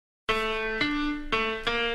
notification

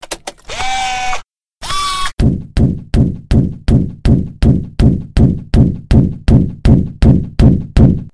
machine robot

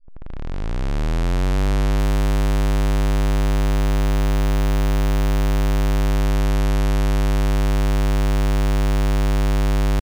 Power Up

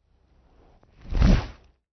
ANIMAL CREATURE

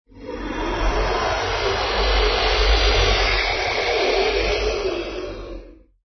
ANIMAL CREATURE

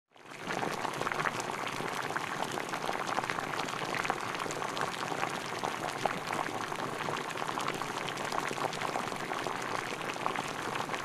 boiling water

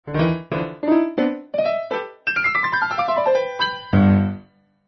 PIANO COMEDY

